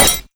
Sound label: scythe break